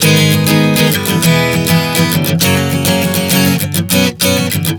G#m-E-F#-B 100 bpm, acoustic guitar chord progressions